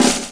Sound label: DRMROLL; E-Lab; X Static Goldmine 2; akai